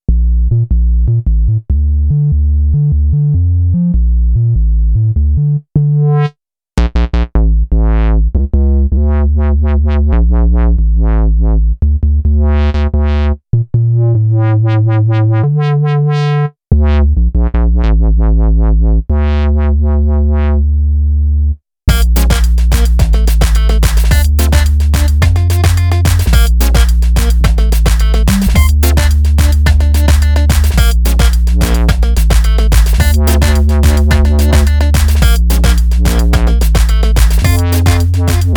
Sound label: Sub Bass, Korg Radias-R